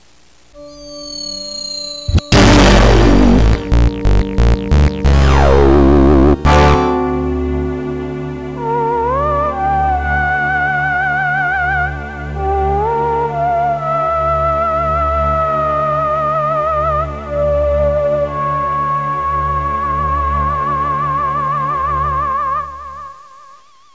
Logo Intro; GAMECUBE; LUIGISMANSION

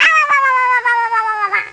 awowowowow; DS; ML BOWSERSINSIDE; Yoshi (unused) (from Mario & Luigi Partners in Time)

